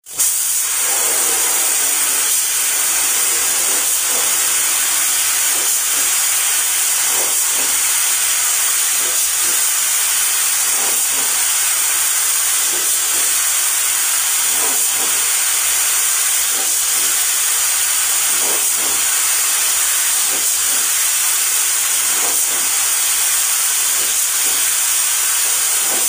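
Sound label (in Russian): Покраска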